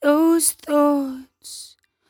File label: 57bpm Am, Velvet Vocal; Zero-G